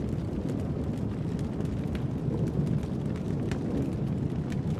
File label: Shaman Loop